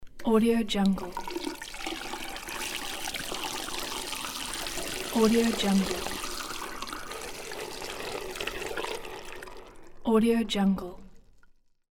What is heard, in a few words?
water sink